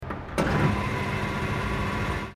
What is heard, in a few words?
petrol pump